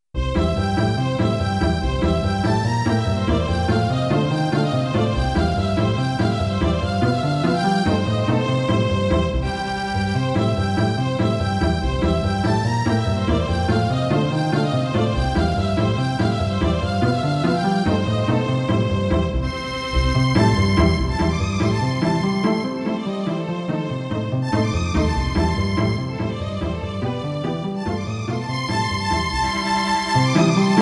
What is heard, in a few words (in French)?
quatuor a cordes